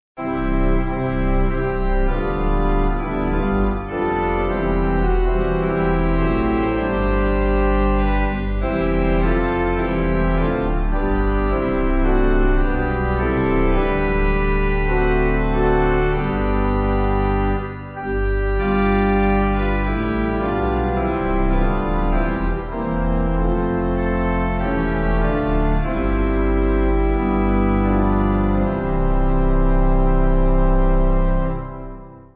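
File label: Pipe, hymn backing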